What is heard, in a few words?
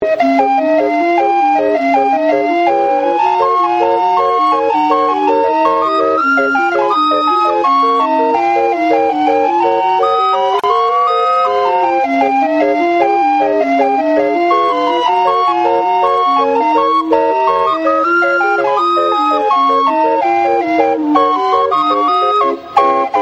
calliope